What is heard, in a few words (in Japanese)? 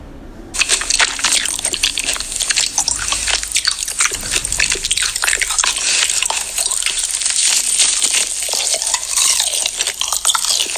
咀嚼音